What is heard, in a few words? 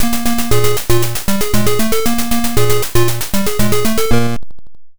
arcade opening; moon patrol